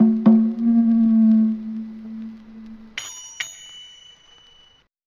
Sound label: sparse conga and bell, drum loops